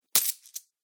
Gold Coins Drop; Video Game

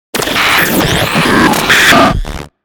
Blip Hiss Harsh Bass Drop; Production Element Imaging Element Accent Transition

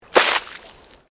Water Splash Concrete Medium; Elemental weather effects; Water Sound Effects; Nature

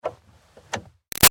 Car Highlander Parking Brake Release; 2005 Toyota Highlander V6; Cars (Sound Effects:Vehicles); Big Room Sound

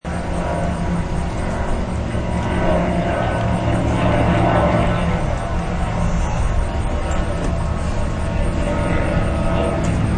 Distant Plane, Small plane flies overhead; Airplanes; Vehicles

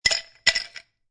Ice Cubes Drop Two Ice Cubes Into A Glass; Drink Mixing

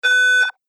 Single Alarm Tone; Alarm Sound Effects

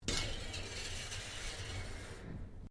METAL TAPE MOVE

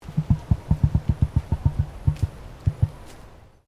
Bird Emu Queensland Throat Noise

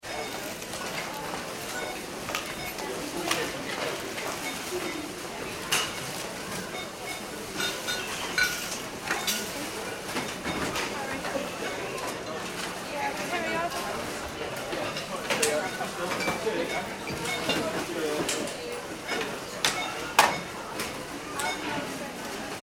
ambience store supermarket; Supermarket Internal Ambience - People Walking And Talking Close - By And Distant Walla; Stores and Malls; McKinney Sound

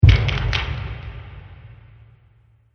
Monster Footstep; Monster Sound Effects; horror

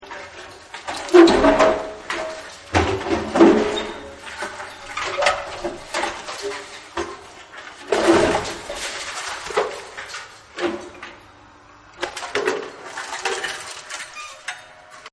Eating A Car, Crunches; Objects

